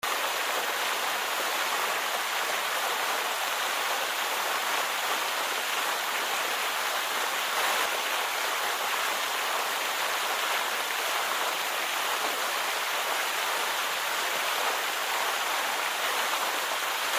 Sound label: water large outdoor fountain